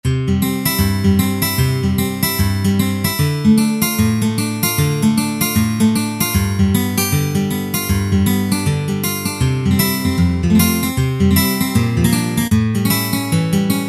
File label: Gtr Pick It; Factory Presets